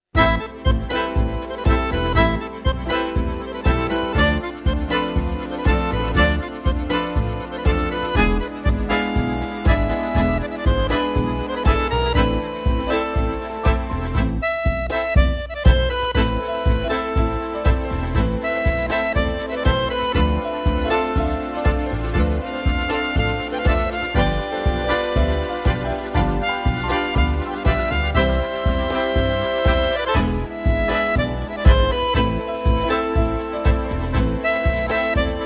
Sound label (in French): tango